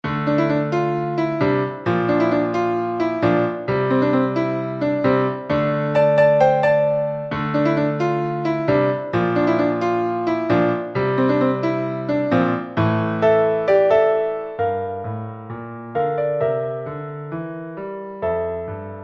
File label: Monday Rock